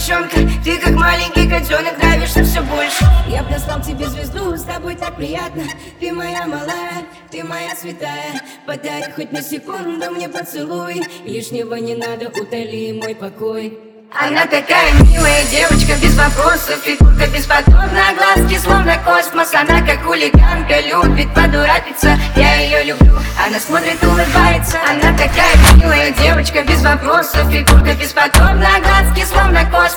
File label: Phonk Remix